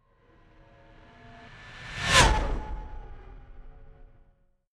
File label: fly by hover car hum high; swooshes-swishes